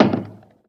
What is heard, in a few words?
Shot